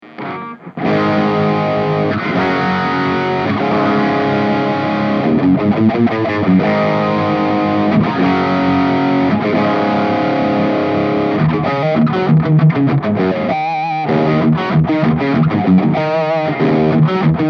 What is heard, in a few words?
creamback